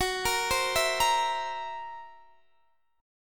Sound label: Fsharp 7th Flat 5th down Guitar Standard; arpeggiated; JGuitar